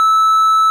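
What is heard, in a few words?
sounds beep beep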